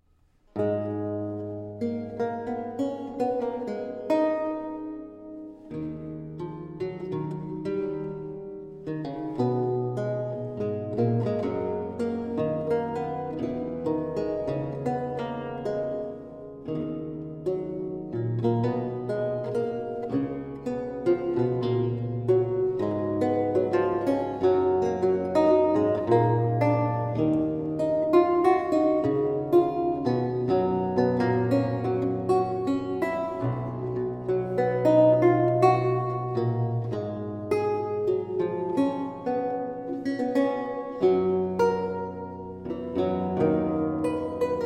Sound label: Prelude, Lute suites; Charles Mouton